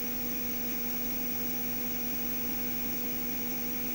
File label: drive spinnd A500; uae